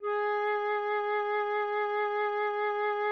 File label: flute Ab4